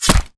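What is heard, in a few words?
impact bullet generic headshot human; Titanfall 2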